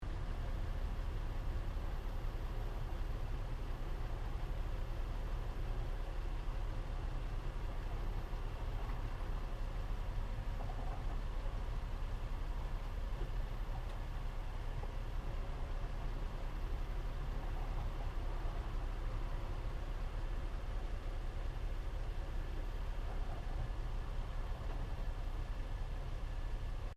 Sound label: CAR NISSAN 350Z EXHAUST IDLE, manual sportscar; Cars; Vehicles